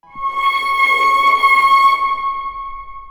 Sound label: horn